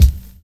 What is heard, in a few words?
LOW SOUND; Univers Sons